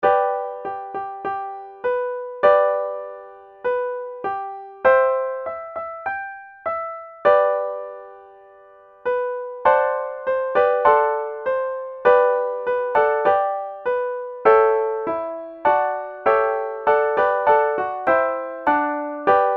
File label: Red Wing melody